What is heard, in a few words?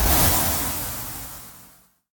Spaceship Flying Away